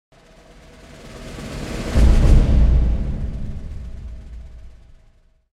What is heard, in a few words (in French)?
crescendo sombre